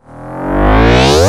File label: Sweeps Short